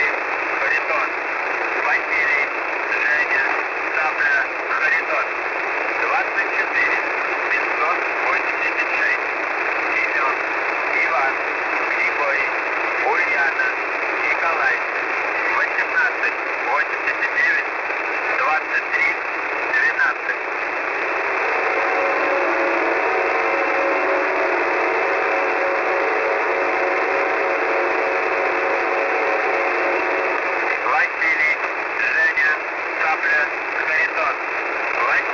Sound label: usb; kiwisdr; 4625.00